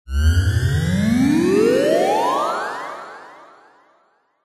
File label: cartoon rise upwards futuristic; zapsplat